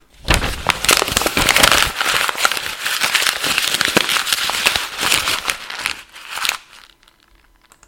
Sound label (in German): Papier knuellen